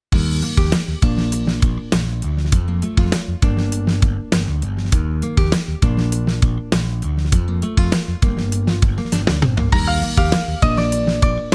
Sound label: healing pop, 100